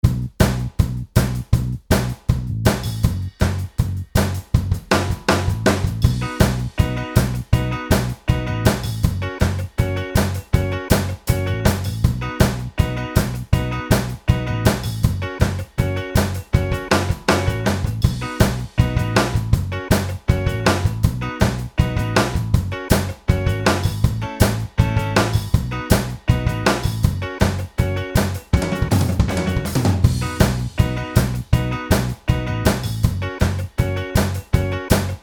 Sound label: Karaoke